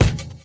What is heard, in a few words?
Kick Hat; One Shots; Drums; Ironman